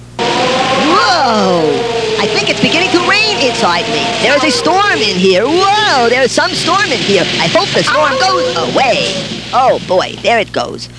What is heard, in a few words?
storm; Monsters, Myths & Legends; "WHOOAAAHHH!! I think it's beginning to rain inside me!